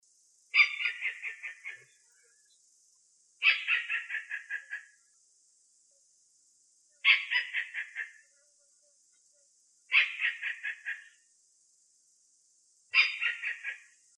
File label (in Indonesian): suara pekaka emas